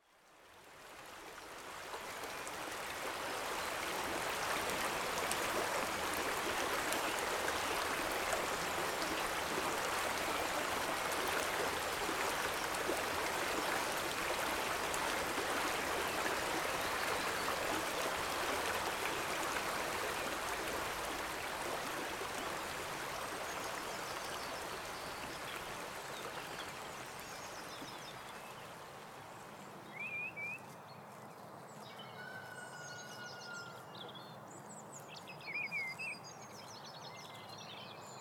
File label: Goppa Walk Soundscape